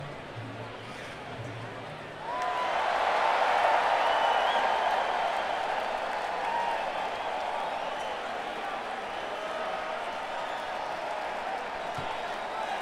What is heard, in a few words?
Crowd; Miami, FL - American Airlines Arena